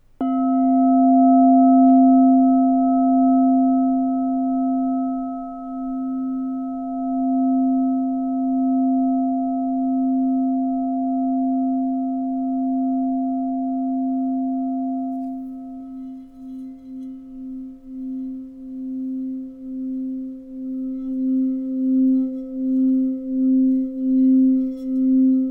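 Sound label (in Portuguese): TACA C4, instrumentos de quartzo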